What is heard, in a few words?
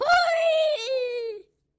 Bird Yellow Flying; fowl